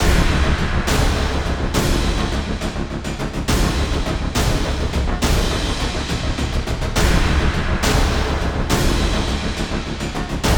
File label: Base Loop low